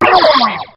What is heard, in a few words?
Bubbler hit